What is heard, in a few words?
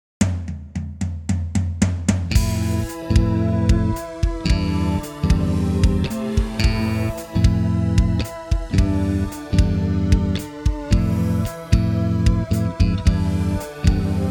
Cool Bass Song